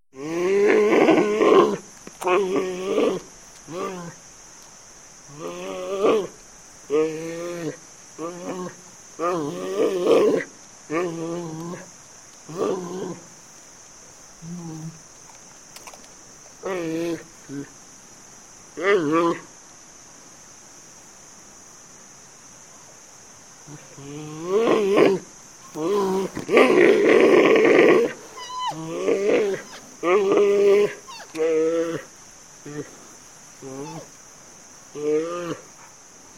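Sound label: panda golos, panda voice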